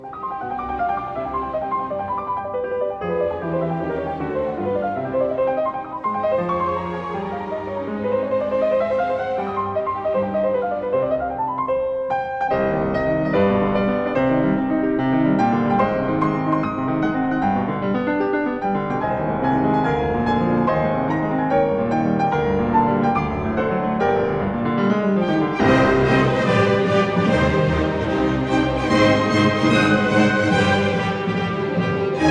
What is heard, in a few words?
Piano Concerto 24; Mozart